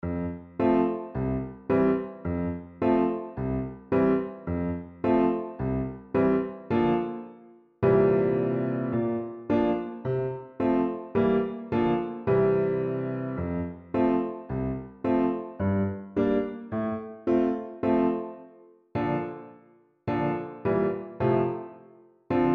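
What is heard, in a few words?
dance song french horn without solo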